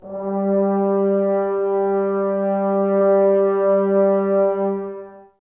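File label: Brass French Horns, Woodwind Brass Ensemble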